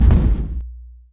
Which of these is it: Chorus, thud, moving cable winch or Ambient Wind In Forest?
thud